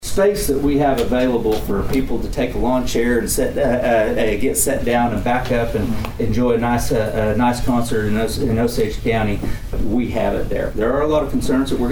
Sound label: Cavalcade Crowd